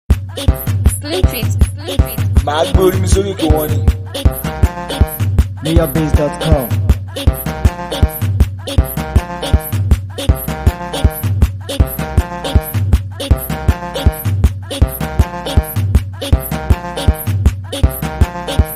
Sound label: Mara Beat